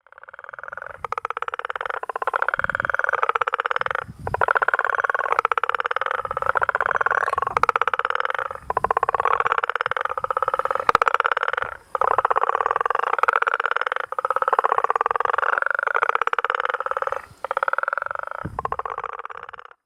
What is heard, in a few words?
Guttural Toad Dullstroom South Africa